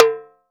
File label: Spok Tom; Roland SPD-S